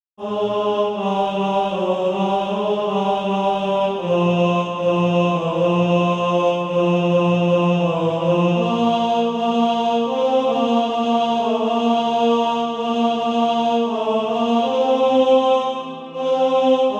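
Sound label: tenor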